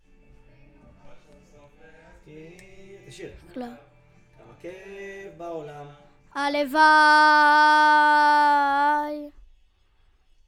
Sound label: VOC